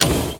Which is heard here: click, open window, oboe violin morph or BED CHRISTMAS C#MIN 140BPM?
open window